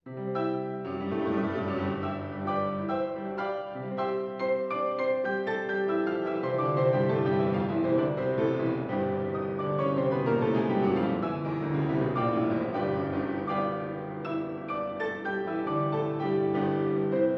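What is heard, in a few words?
classical piano play; The Sims 3; Windows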